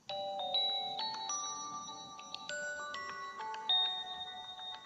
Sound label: Oppo A77 Mediatek Sms Ringtone